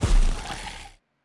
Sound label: Enemy Woodsman Walk